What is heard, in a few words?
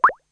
click; FingerGuessingMaster